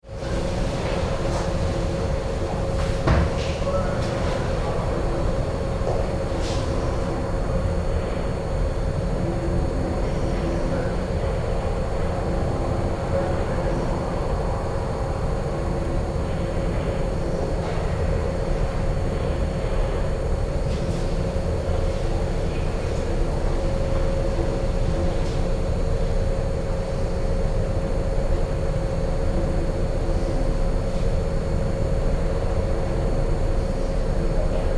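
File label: Police Station Atmosphere, Very distant voices - aircon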